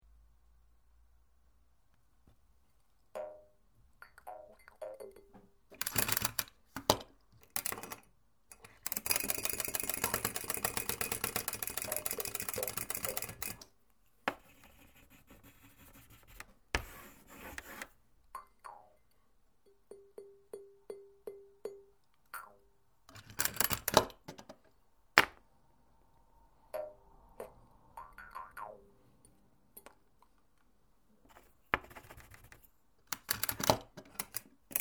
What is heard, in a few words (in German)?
die wohltuenden geraeusche des staubsaugers